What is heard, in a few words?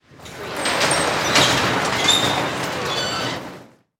Warehouse Door Open; Sound Effects Bible